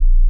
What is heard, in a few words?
B flat 0, pure tones